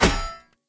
Polearm Medium Impact Default; Weapon Impacts; Player; Environment; Sphere Polearm

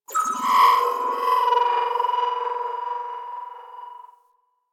Reinforce Beacon Call; Warframe; Venus; Reinforcement Beacon

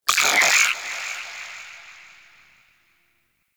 Vocal Pain, Shrimp Lantern, Creatures; Baliq

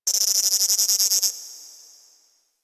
Ocean Critter Insect; Warframe; Grineer Ocean

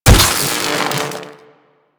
Electro Prod Hit Flesh; Warframe; Weapons

